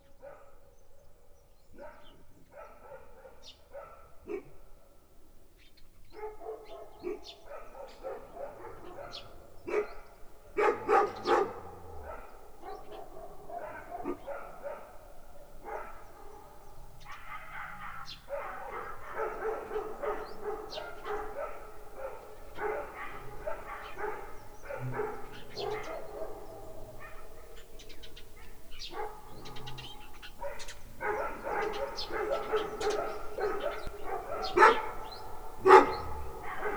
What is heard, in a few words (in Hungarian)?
térhatás madarak, kutya; állathangok; paraszt udvar